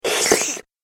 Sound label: Slurpy Hungry Sound, slurping; human